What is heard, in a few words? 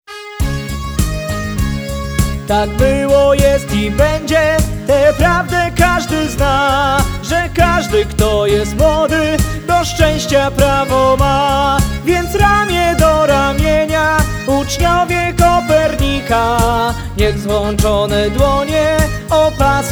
hymn